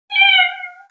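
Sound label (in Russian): звук кошачьего "мяу"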